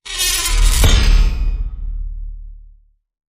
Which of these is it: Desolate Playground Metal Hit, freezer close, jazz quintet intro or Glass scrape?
Desolate Playground Metal Hit